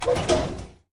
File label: Metal Hatch Open; Lethal Company